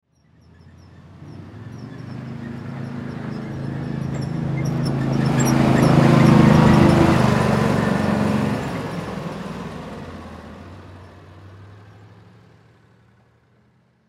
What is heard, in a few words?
Golf Cart John Deer Gator Utility Pass By; Golf (Sound Effects:Sports; Blastwave